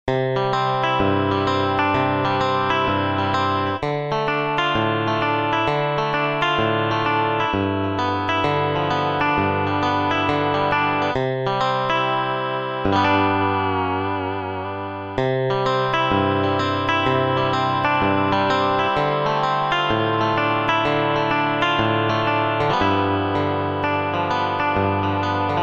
Nashville; Roland SRX-07 Ultimate Keys; NashvilleSRX

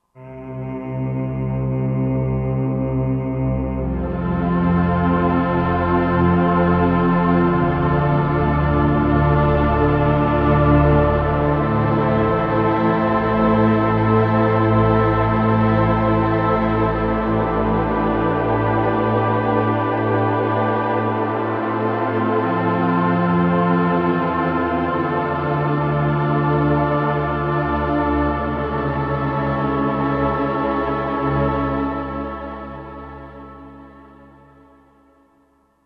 Tron Orch, Roland SR-JV80-08; '60s & '70s

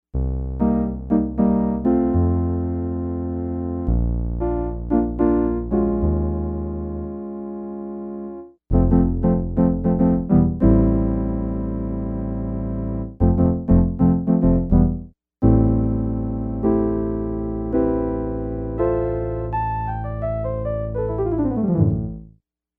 Africa

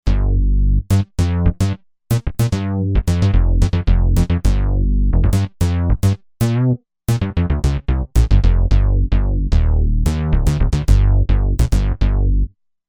Bass Pulse Bass

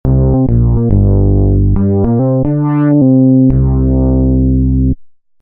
Wonder Bass, Roland V-Synth; Factory Patches

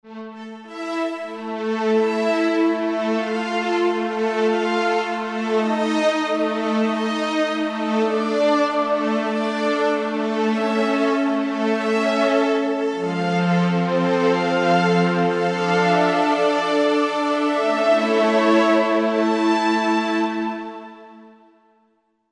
2 voices; Korg MSC-12S Synth 3

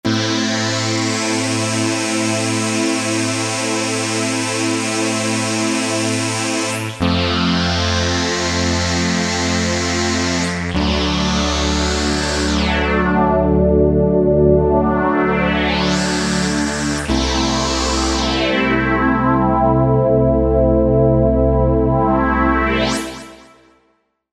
Sweeper

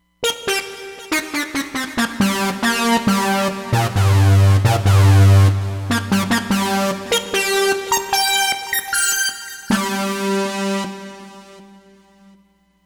Sync Modulate

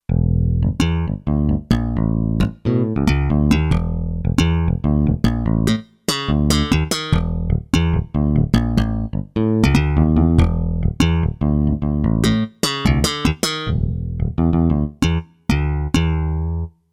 Dual E Bass, Kurzweil K2500X; Factory Programs

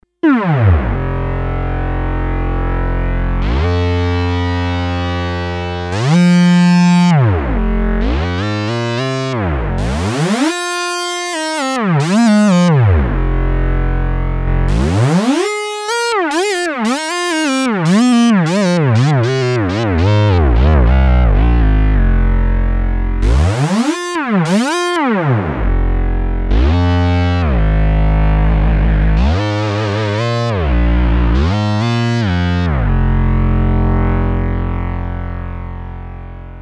Synthbass unison, Sequential Circuits Six-Trak; Factory Programs

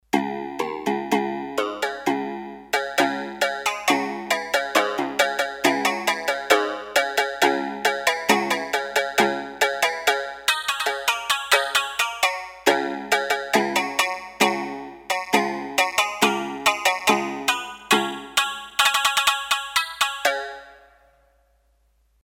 Shamisen, Roland D-10; Factory Patches

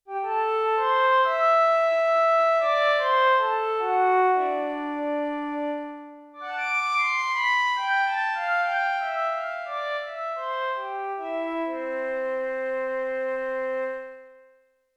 Slow Attack Flute & Piccolo; Yamaha TX16W

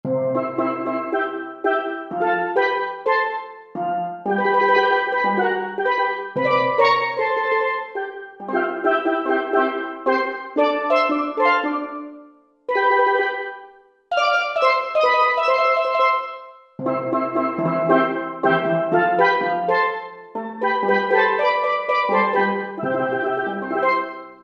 Steel Drums; World; Roland SR-JV80-05

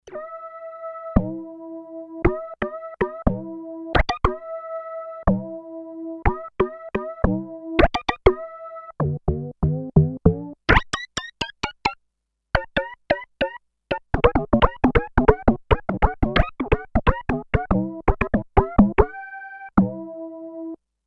Percussion unison, Sequential Circuits Six-Trak; Factory Programs

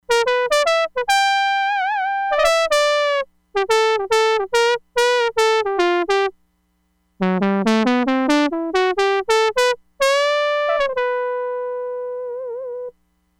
TRUMPET, Crumar Bit 99; Factory Programs

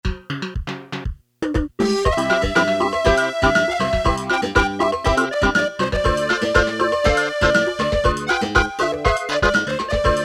Samba, Casio CT-370, Rhythms

